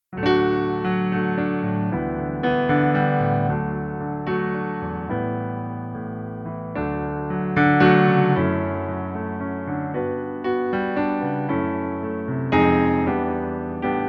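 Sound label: piano playback D major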